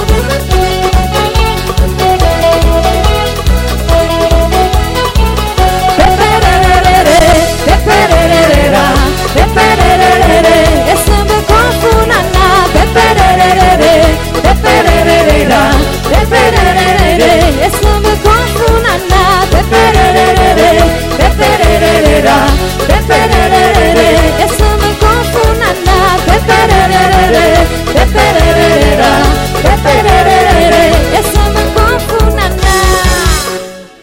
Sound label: samba com funana